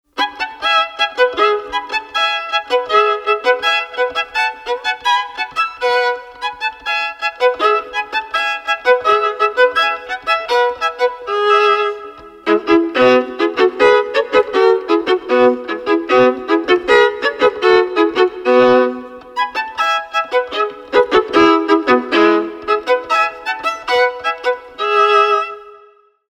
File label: Violin Solo; Paganini Caprice